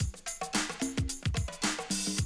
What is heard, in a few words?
110, Classic Drum Loops